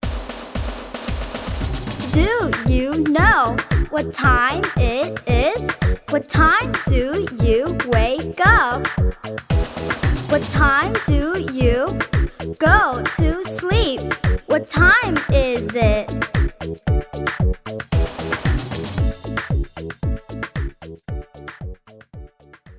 영어여 Chant

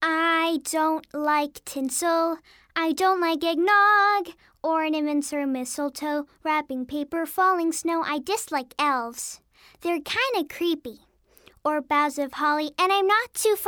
영어 여 여아 노래

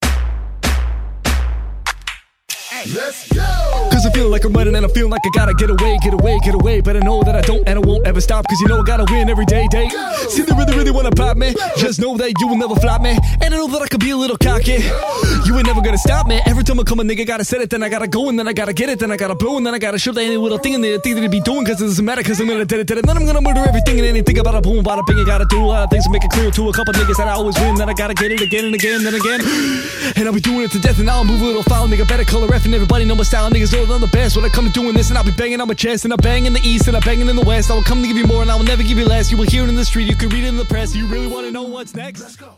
영어남 랩; 남자

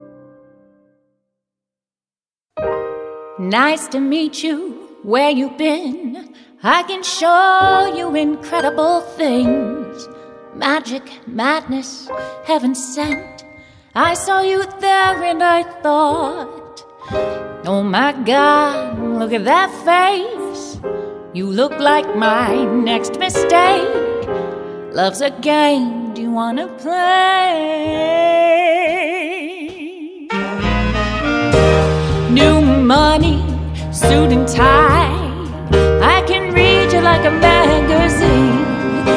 영어 여 노래

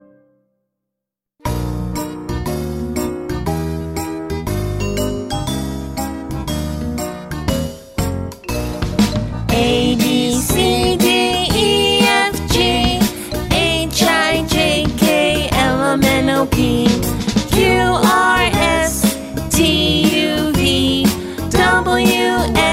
영어 여 노래 알파벳송 남아 여아

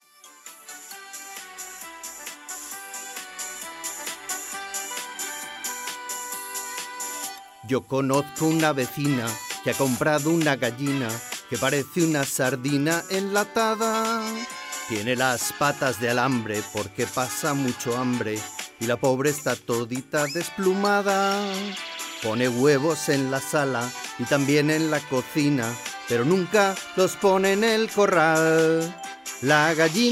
스페인남 유럽 노래; 남자

